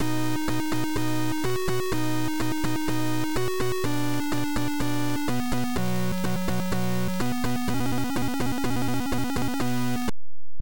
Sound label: Intro, Beeper